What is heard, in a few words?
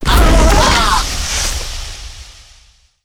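ghost death, monster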